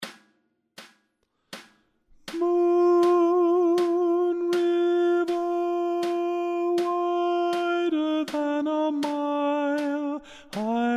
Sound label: Alto